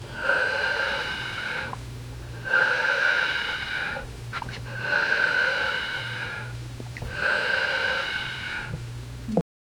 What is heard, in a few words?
animal